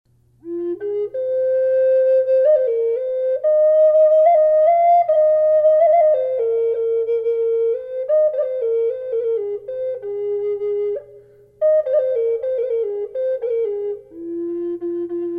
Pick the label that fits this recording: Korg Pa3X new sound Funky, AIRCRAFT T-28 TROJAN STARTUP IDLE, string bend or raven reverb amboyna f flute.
raven reverb amboyna f flute